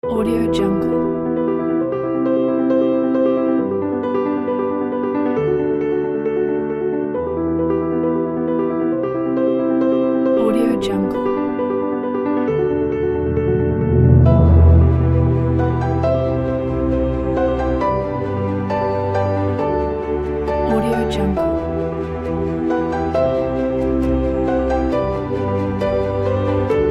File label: dramatic emotional cinematic piano trailer